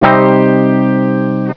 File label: Guitar; Sound Library 2000; Wayzata Technology